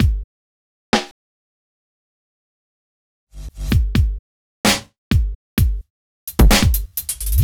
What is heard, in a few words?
drums; Pony Fan Music Remix Stems